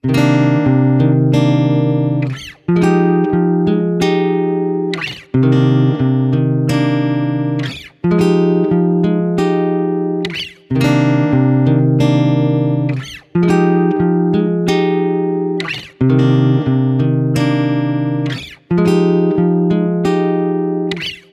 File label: Guitar 90 BPM (C9min); Guitar Loops; Daily; Ghosthack; Guitar Loops & Shots